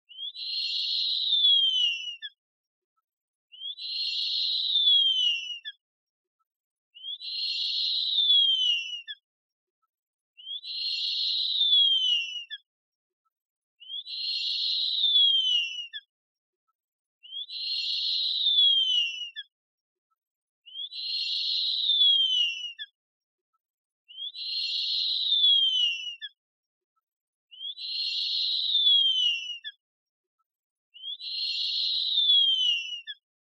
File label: Fish Owl Juvenile